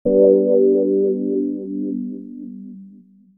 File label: Digi Pads; Jungle Frenzy 1